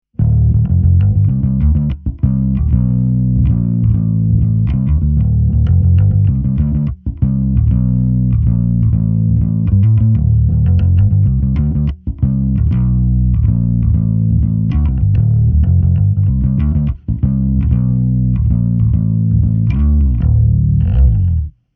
Bassman 300; fender

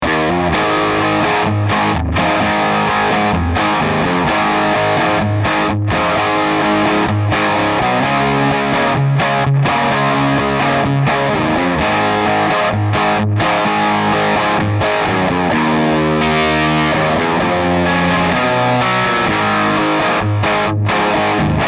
Rock, MultiFX; iRig UA

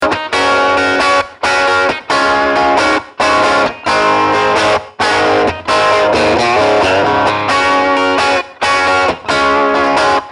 Rock n Roll 600 + Fuzz; fender

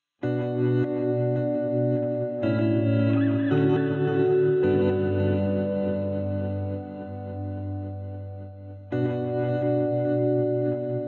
TIME REVERSE; xgear

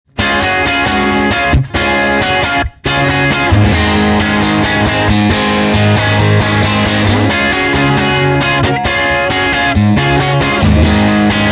59 Bassman Rock; fender

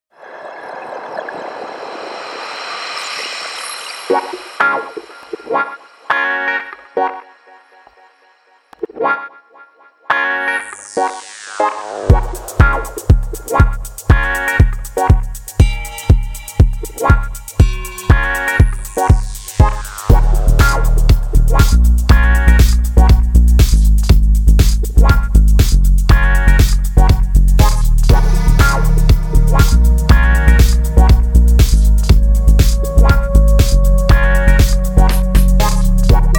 Elektronika Indie Dance; SampleTank 3 CS

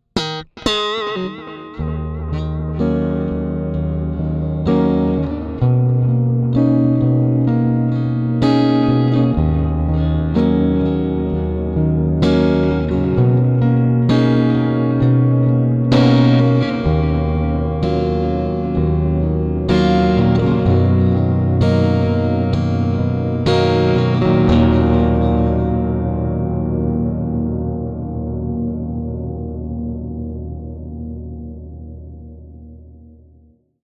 Two 57s and Tape Echo; fender

